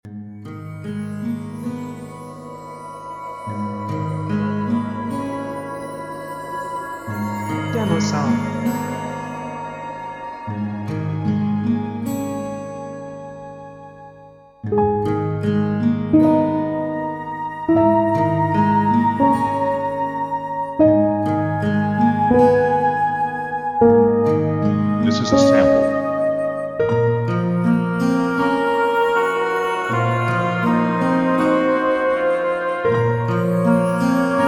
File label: Walking Alone Flute Soft FX